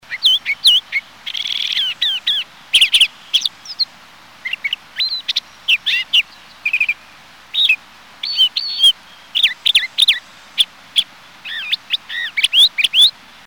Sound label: Crissal Thrasher